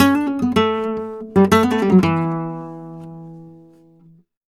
Flamenco Guitar Picado, a; Best Service ProSamples vol.46 - Flamenco

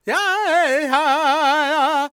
G# MIN, World Voices, LAKOTA

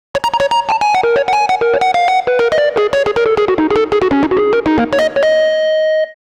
Rhodes Solo, Cinematic Funk; Sony Creative Software; Cinematic Funk Urban Tone Poems

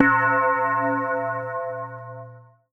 BELL-GONG, A#; Big Fish Audio

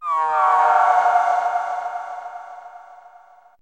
SPACY, ATMOS; AMG - Vince Clarke Lucky Bastard

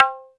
Perc, Alesis DM5

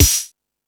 Discoball, drum machine

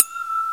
ALIEN, Fairlight CMI SFX

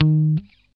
GUI D#3, E-GUI MUTED; Sound & Vision; Gigapack I CD 2

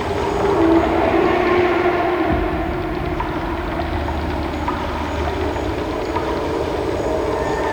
ambience

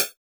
Hat, Genesis; ToeJam & Earl 2

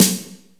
AMB SNARE; AKAI S6000 CD-ROM - Volume 4; Snare 2

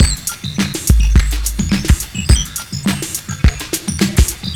cartoon cat, 105bpm; Zero-G; Freak Beats

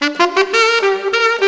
Sax Funky; Sonic Foundry (Sony Creative Software); Knutrix Drum & Bass

